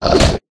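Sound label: lizard block; yasher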